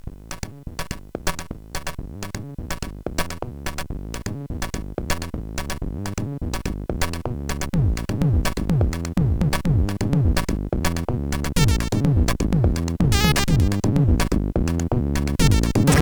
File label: sid CSG8580; Go to Work; hvsc